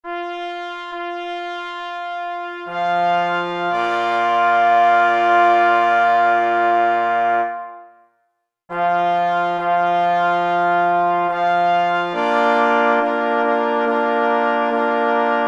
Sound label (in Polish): Fanfara 2 plessy i 2 parforsy; N. Blum; Fanfara na czesc munsterlandera; pies w muzyce lowieckiej dodatek